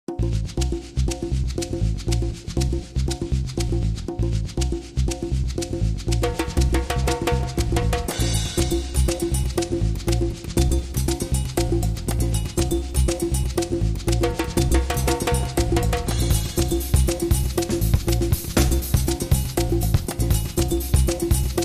Trinidad

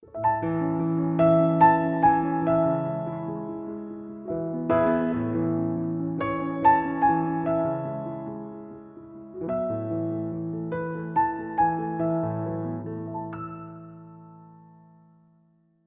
Music Box

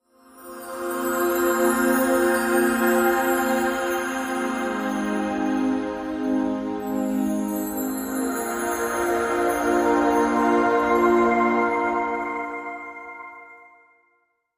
No Piano Or Woodwinds

